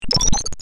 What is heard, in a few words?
Bleep